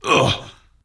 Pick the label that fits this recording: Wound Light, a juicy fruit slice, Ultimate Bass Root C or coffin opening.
Wound Light